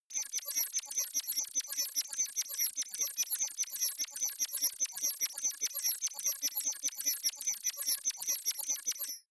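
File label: crazy baby birds, science fiction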